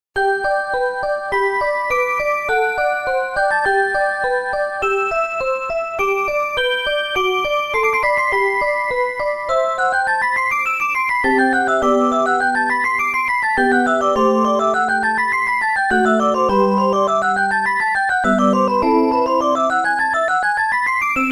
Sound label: Sonata fur Klavier K.545